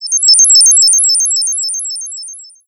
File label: OB FX; Masterbits; Vintage Voltage; SEQ-FX